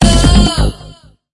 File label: gamble lose